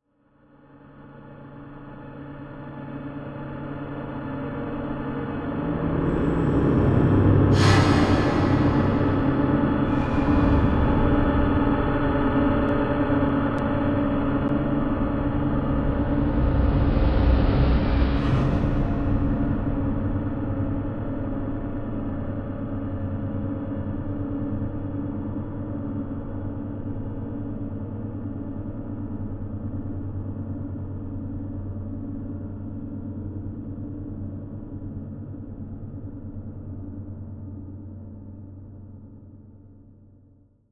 Electronic Whoosh Reverse Feedback Digital; Production Element Title Imaging

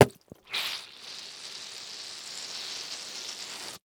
Foley Plunger Wet Smacking Cement Forcing Down

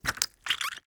Cartoon Toy Squish With Squeak

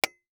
Impact Metal Object Solid Hit By Plastic Tube; Impacts

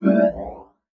Flash Whoosh; Multimedia Internet CD-Rom Flash

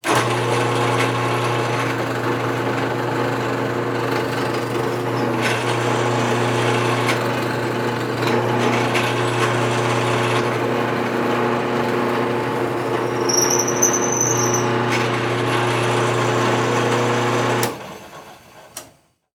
Industry Tool Drill Press Run

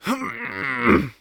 Human Male Groan Lifting Weights Short

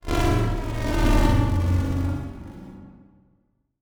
Transition Sweep Warble; Production Element

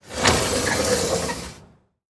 Door Open Screen Door; household

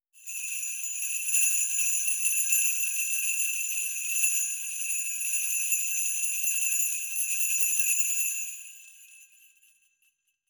Sleigh Bells, Christmas Sound Effects; PremiumBeat

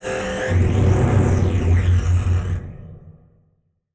Title Transition Evolver Electrical; Production Element Title Transition

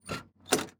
Transportation Plane Door Open

